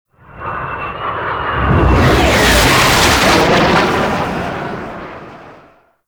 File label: Sentient Fly Over; Mission One; Kahl; Warframe; The New War